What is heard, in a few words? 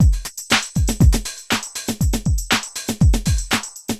Bonus Beat (Funky), Drums